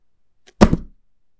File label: Book Drop